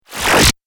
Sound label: Laser Suck; multimedia